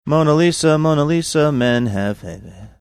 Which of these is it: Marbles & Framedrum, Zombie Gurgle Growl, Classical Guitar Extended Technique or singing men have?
singing men have